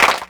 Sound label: Clapbox Pitch11; Ableton Foundation FreePacks